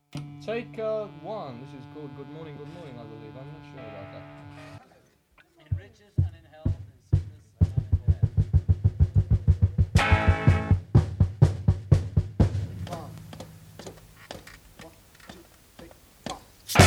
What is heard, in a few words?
Instrumental, Breakdown